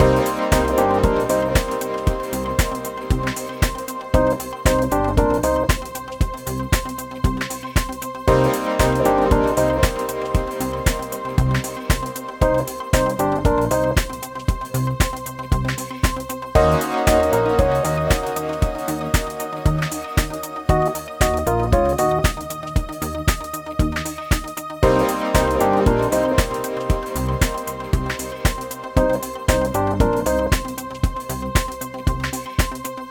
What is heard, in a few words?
Chill house music loop 116 bpm